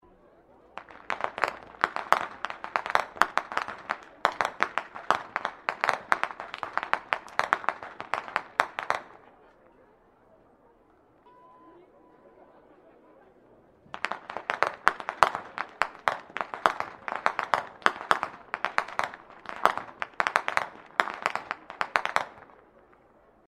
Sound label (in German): schnalzen, jugend